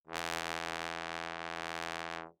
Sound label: Bass Trombone ff E2; MIS Pitches - 2014; Brass